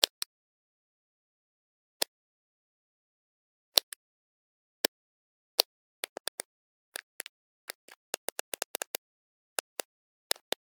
switches; devices accessories utilities; NS Studios - ultra sound collection

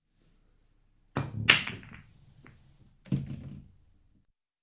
Billiards Break; Sound Ideas 2000 Series

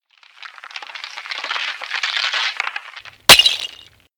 freeze actual break; Apotheorasis

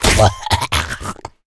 zombie hit; DeadFright

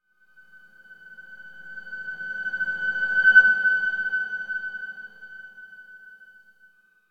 Feedback Intrusive Tone - Strongest Middle; Hollywood Edge; sound designer's toolkit v2

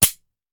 Hand Gun Foley; Sound Ideas; Guns

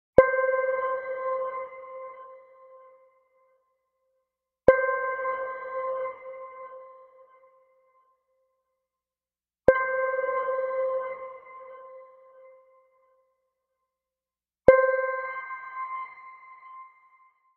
Arcade Click Neutral; boom library; The Interface

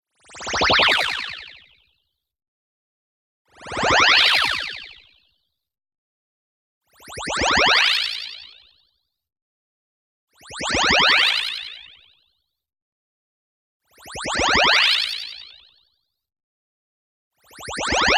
tonal, power fly by; boom library; Anime Essentials

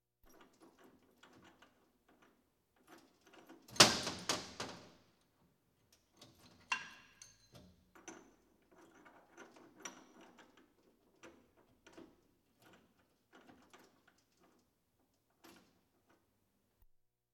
Police, Fire engines

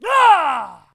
voice attack male; Blind Gladiator

